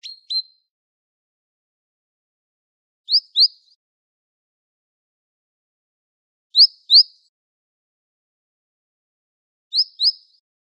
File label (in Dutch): Koolmees twee roepvarianten, Wageningen